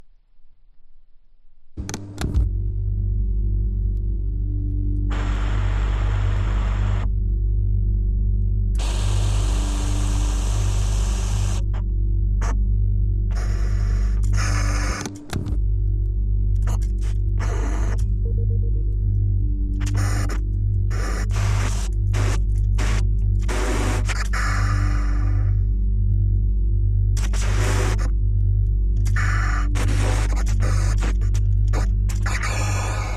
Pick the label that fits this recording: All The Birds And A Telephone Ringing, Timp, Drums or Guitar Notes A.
All The Birds And A Telephone Ringing